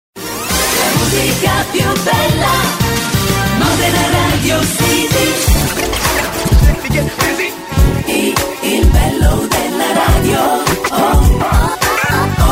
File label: jingle